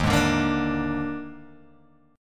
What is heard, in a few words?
Eb Major 7th Suspended 4th Eb, Guitar, Open G